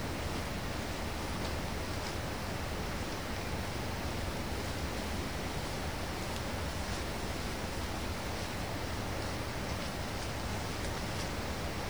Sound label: walkup; Bipedal sounding approach with sniffing at 0038hrs 5-4-08